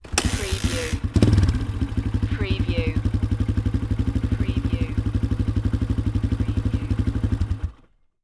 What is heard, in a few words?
Motorcycle Dirt Bike Kick Start and Shut Off